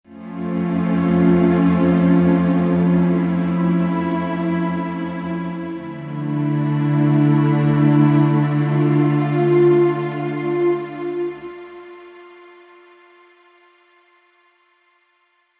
NRG Pad

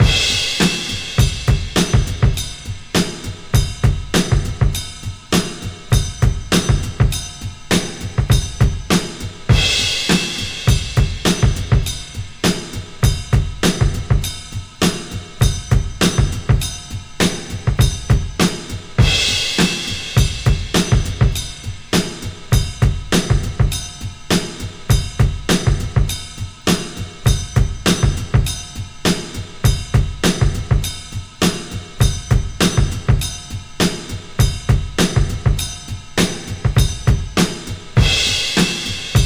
101.14 Rocky Ride, breaks; Lockdown Breaks

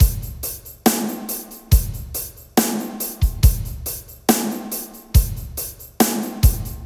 SloMo Beats, Loops 140 BPM

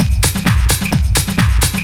130 tribal, LOOP PERC TRIBAL; DRUMLOOPS; Ueberschall - Techno Trance Essentials

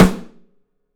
PICOLO; 3D Drums

